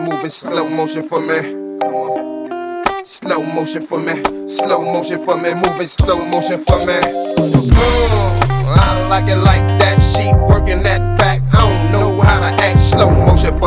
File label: Slow Motion Sample